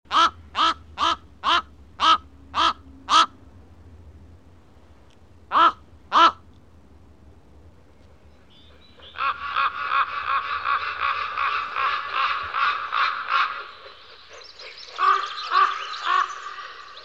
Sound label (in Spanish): cuervo